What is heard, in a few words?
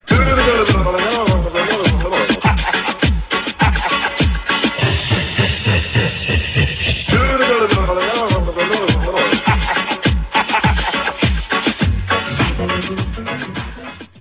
hahahaha